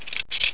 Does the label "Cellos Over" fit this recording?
No